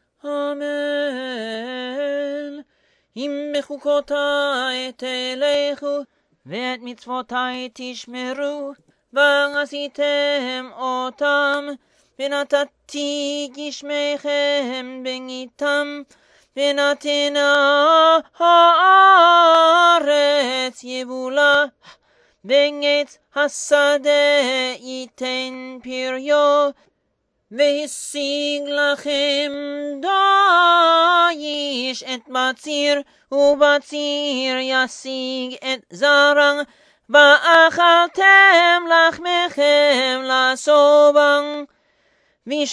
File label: 1st Aliyah D Minor; Behukkotai